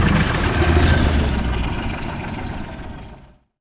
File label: hunter stop; gdi